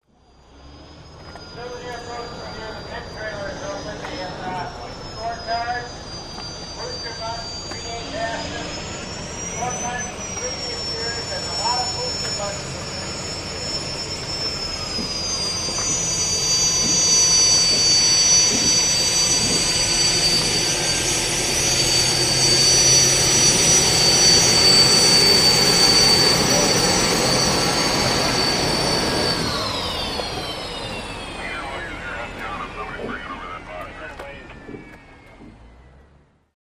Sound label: Hydroplane Dock Amb